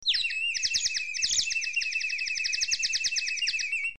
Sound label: whistle